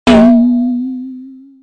cartoon drum; TECKNAT

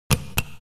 button, knappar på - av-ljud

